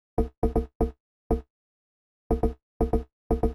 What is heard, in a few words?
Drum; hydrogen